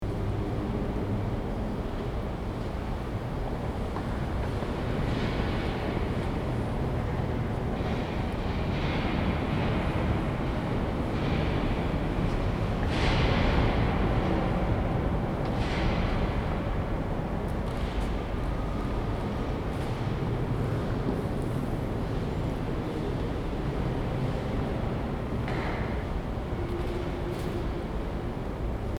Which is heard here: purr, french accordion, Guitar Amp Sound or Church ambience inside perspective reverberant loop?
Church ambience inside perspective reverberant loop